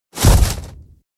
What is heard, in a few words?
Creature Enters